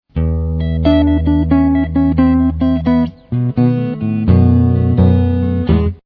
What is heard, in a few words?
blues guitar